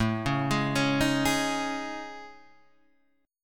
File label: A Major Sharp 11th, Guitar Open D